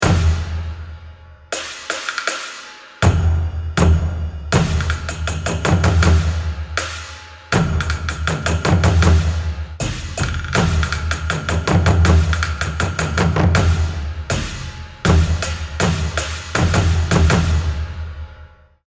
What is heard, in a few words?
start round, music